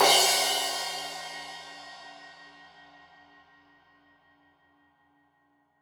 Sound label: Crash 15in L edge, Cymbals Stereo